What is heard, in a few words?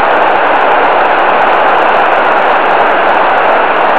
river sound; japan; shikoku